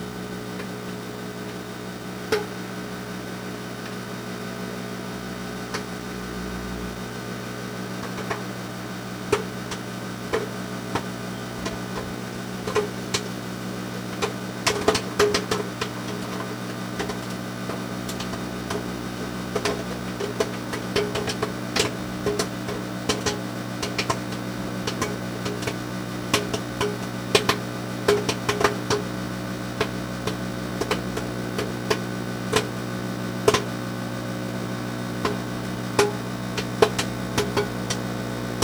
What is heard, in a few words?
popcorn; valve; simp xmas